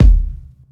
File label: tight lows kick sample e key; kick drum samples